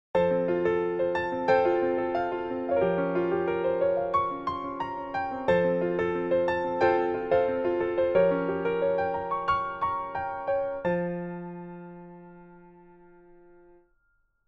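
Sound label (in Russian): красивая и красивая фортепианная музыка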